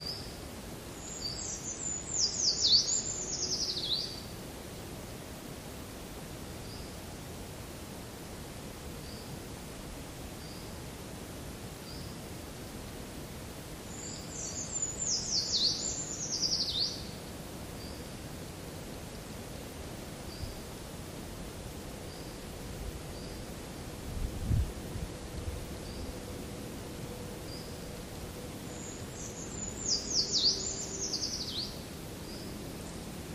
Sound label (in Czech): Soupalek Dlouhoprsty; poznvme bory